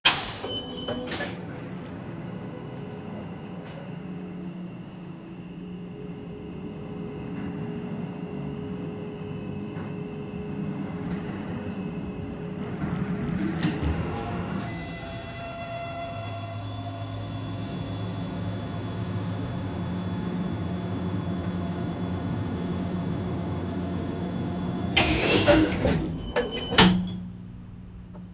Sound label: a elevator sound that has